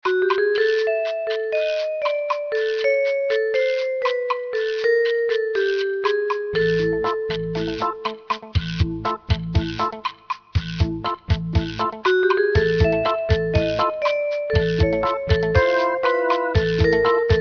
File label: lounge